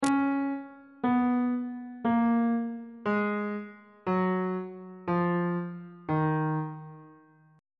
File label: d sharp aeolian mode descending on bass clef